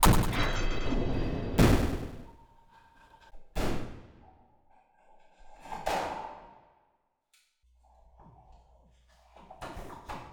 bang

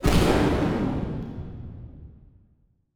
explosion

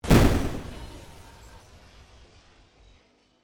explosion

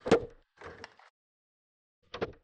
knock

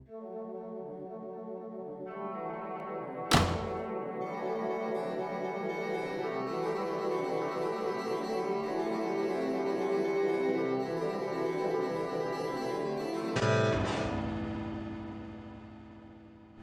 ensemble

